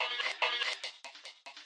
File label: voxuguy, vocal or voxy loops